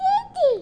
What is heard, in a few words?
Get Crystal; Ruckus